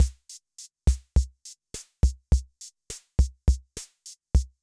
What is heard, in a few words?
bossanova, Yamaha MR-10